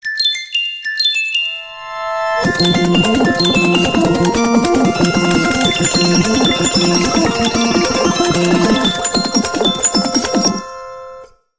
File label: oppo reno 6 ringtone